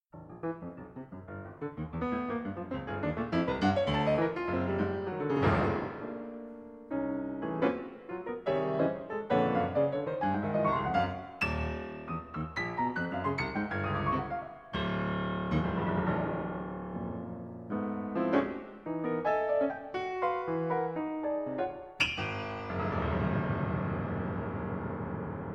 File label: for solo Piano